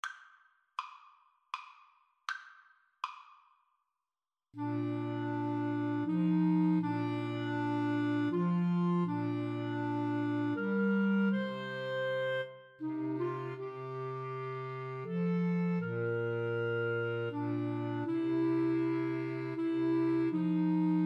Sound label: Clarinet Trio